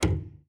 Aircrafts Cockpits Stick Pitch Lim; DCS

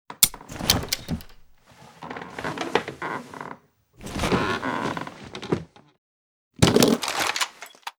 icbm rescue; Call of Duty Modern Warfare

